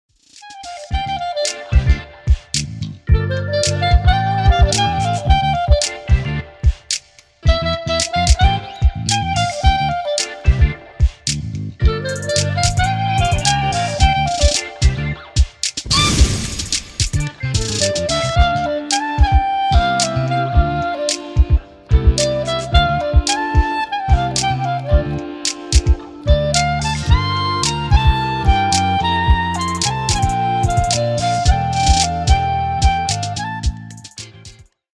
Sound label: Clarinet